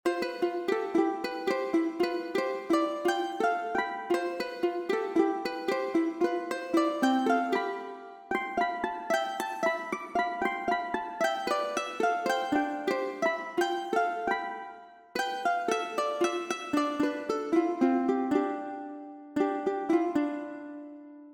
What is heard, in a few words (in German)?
Aloha Sonatine fuer Ukulele Solo